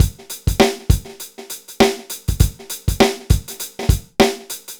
dry loop, BPM100

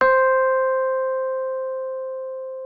C5, Rhodes; Xsample VOL-1

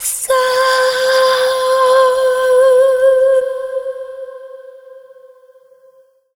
FEMALE, Dance Vocals

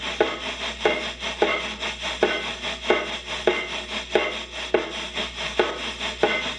Chamberlin Rhythmate Loop, Drum Machines

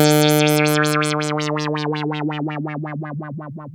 2600 D3, ARP 2600; Hollywood Edge - Giorgio Moroder Rare Synthesizer Collection

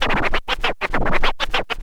scratch short, Vinyl scratches

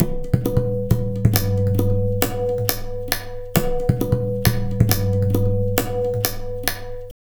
Pure Tabla, 135BPM; Zero-G

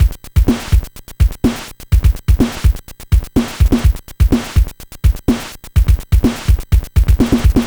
NES Drum Loop

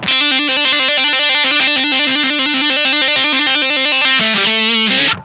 BIG TRILL, Funk Guitar; Zero G - Funk Guitar

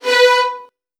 B2, VN 16TH, Virtuoso Strings; ILIO; Kirk Hunter

